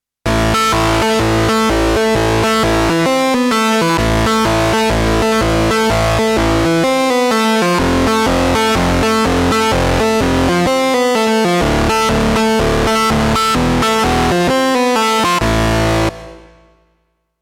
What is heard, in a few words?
Synth kitarled